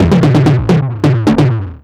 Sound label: Percussive